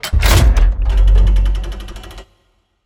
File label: security door open; Counter Strike Model Pack; CS:GO; survival